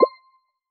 Choose, User Interface Menu; Advanced UI

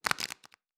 cards shuffle

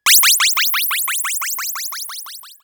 UFO, Retro Games Sound FX

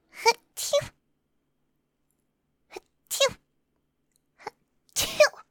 sneezes

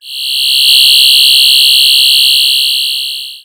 Hum; Retro Games Sound FX

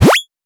Slide, Cartoon Games Sound Effects

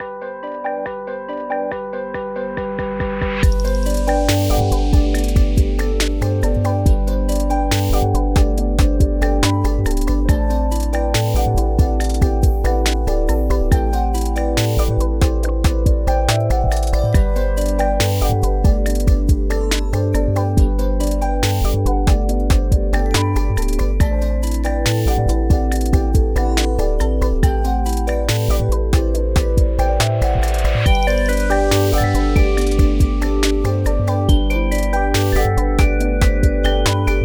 Kawaii Love Lofi Music; Kawaii Love Lofi Music Pack 2

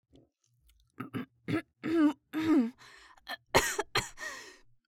suck

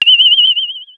Uniscanner, Retro Games Sound FX

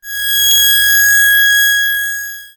Machine, Retro Games Sound FX

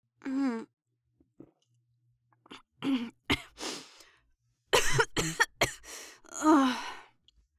suck

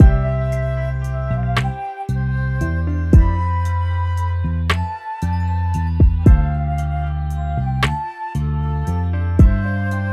Lofi Music Loop; Kawaii Love Lofi Music Pack 2

